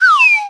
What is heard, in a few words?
se cancel